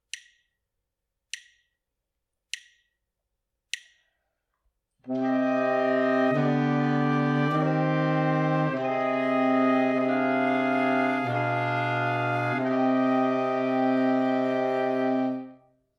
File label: harmonic dictation